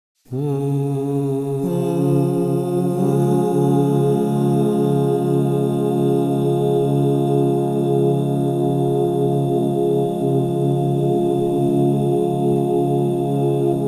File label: Re bemol major, crystal bowls; Yoga and sound